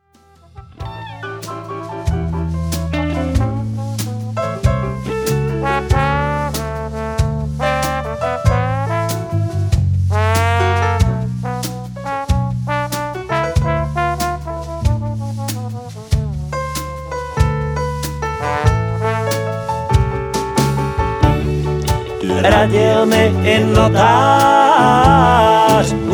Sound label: blues